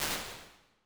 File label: warm room, Medium Rooms, IR Samples; Convolution; NI Kontakt 5